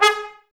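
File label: trumpets G#, brass; Miroslav Vitous; Woodwind Brass Ensemble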